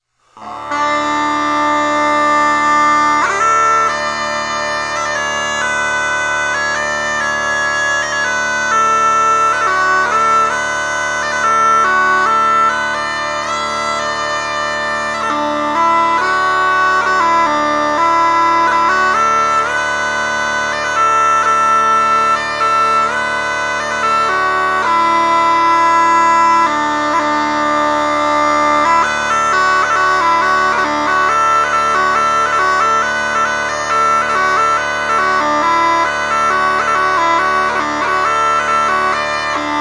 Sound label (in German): tief De D-Dur, dudelsack